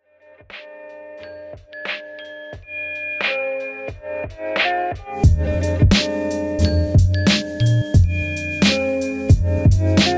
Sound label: Lofi Hip Hop Anime Chill Beats